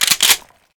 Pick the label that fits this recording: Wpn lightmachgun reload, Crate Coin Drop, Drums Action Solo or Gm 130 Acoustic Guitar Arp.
Wpn lightmachgun reload